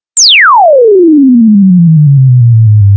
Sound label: sweep; SigmaStar; SSC9341 Ispahan